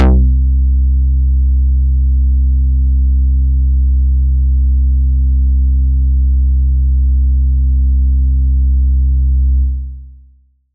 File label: Quick Bass